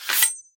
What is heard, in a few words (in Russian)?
metallicheskij lyazg ostriya machete